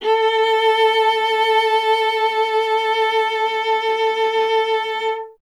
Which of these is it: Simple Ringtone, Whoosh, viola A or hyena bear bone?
viola A